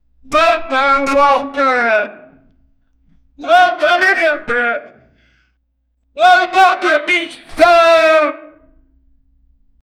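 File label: grunts of a fallen champion, combat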